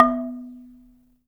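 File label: AFRICAN MARIMBA B 3; Heart of Africa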